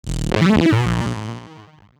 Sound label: Bass drone, Circuitbend; Drones; 99Sounds; Mangling Audio; F(maybe)